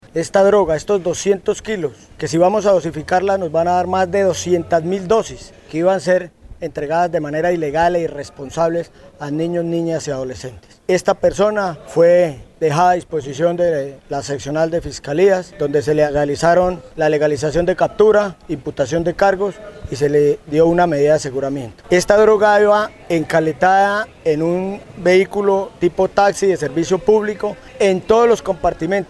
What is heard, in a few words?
MARIMBA